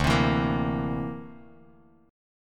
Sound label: D 7th Suspended 2nd Sharp 5th D, Bass Standard; D7sus2#5 strummed; JGuitar